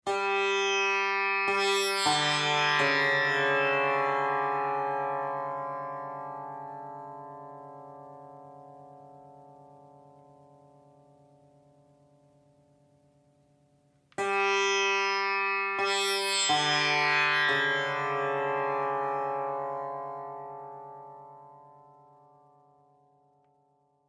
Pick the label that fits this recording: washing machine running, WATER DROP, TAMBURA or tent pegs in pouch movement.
TAMBURA